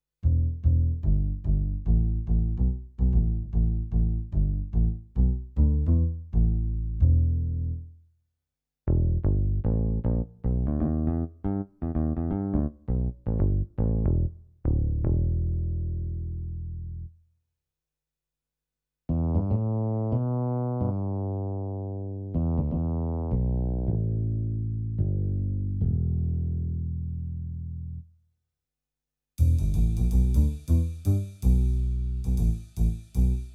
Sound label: Bass; Kawai ES7